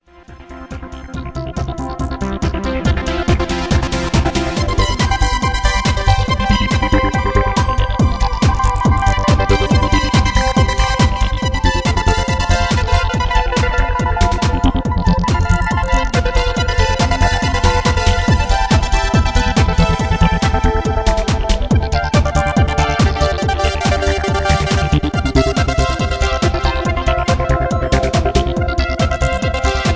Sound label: Synth Solo